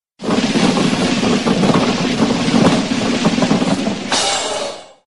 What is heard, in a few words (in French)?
motus tirage boule